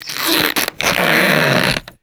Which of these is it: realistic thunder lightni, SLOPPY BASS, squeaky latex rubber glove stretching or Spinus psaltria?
squeaky latex rubber glove stretching